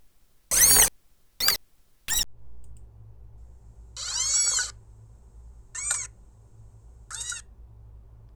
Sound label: high squeak